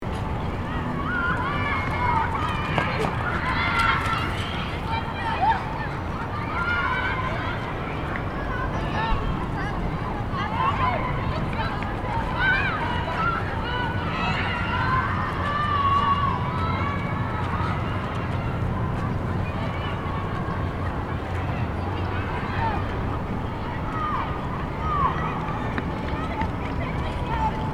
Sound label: City playground ambience near by traffic loop